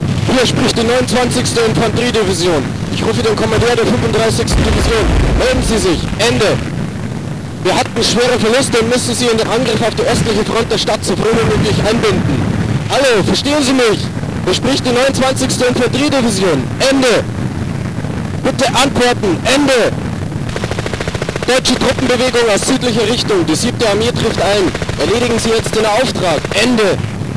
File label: thunder; german